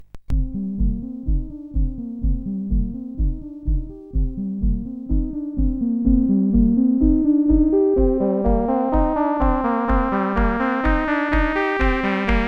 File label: disco, sid CSG8580R5